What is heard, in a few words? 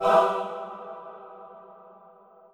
choir